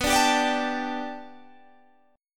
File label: B Minor 7th Sharp 5th B Guitar Half Step Down, chord